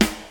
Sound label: clear snare single hit a key, Royality free snare single hit tuned to the A note; snare drum samples